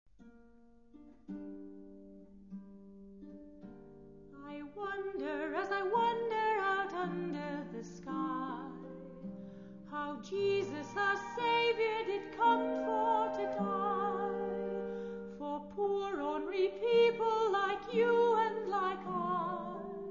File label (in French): voix; berio